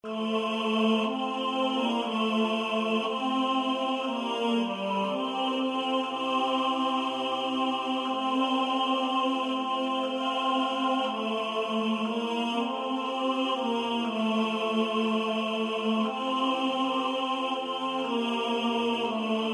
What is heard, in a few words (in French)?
Tenor Voice un ton plus bas